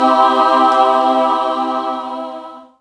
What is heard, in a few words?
human kill; zombie elimination